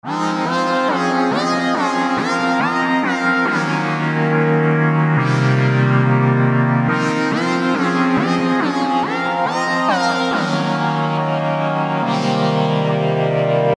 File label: 140 F Pad